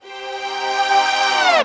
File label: Misc Short FX